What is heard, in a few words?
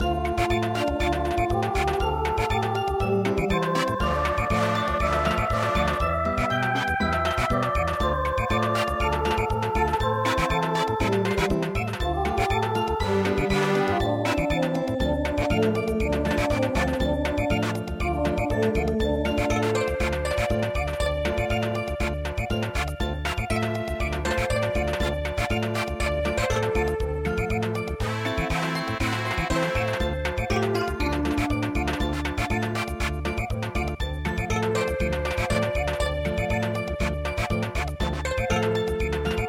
yasuki bushi Samba